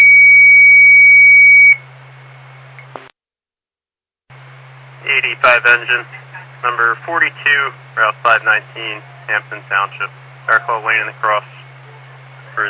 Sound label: Siren; Lafayette FD